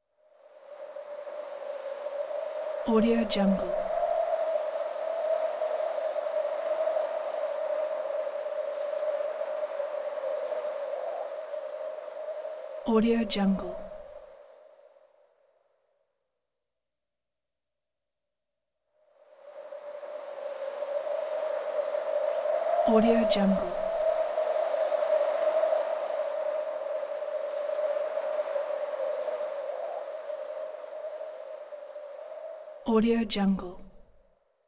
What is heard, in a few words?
whistly power line wind howl soft swish